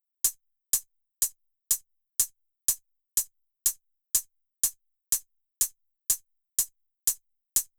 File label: Closed Hat, 123